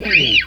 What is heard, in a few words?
GTR SQUEE, Funk Gtr & Wah; PlatinumPhattCD2; E-MU Producer Series Vol. 8 – Platinum Phatt